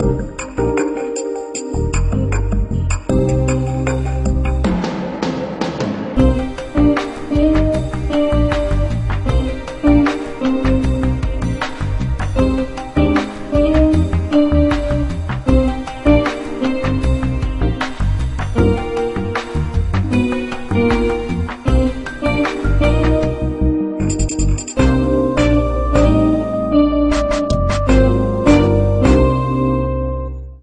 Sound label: Chill